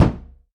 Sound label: bass drum sound a sharp key; kick drum samples